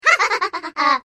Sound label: witch laugh